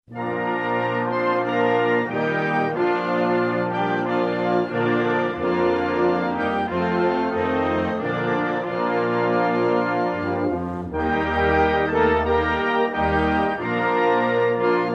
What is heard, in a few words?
Fiji National Anthem